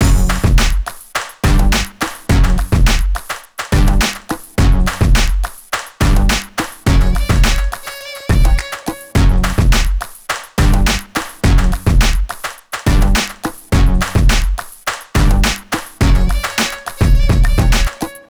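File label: Streetdance Instrumental; Star Academy Showtime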